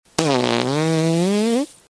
fart

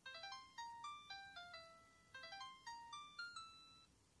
qtek ringtone

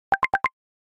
Call line

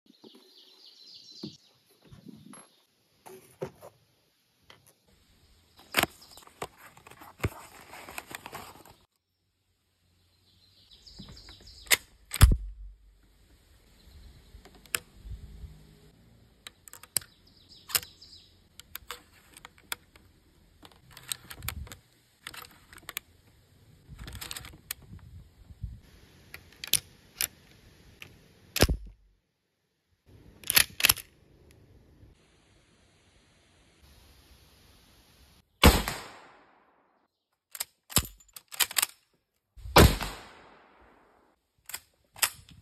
Lebel, صدای تفنگ; تفنگ لبل

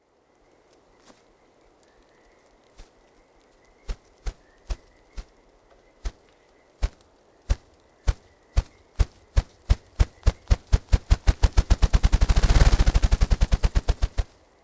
Grouse

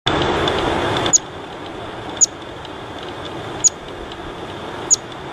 Richard's pipit; پیپت پا دراز

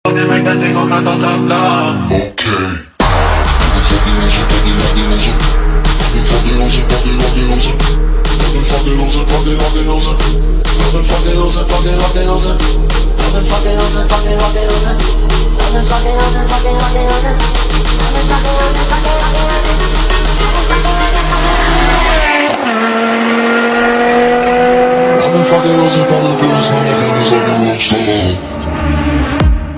ringtone

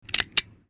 revolver cock

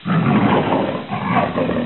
Leopard Sound

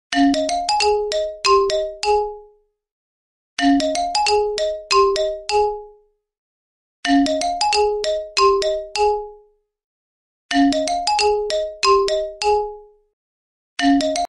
Imo Ringtone

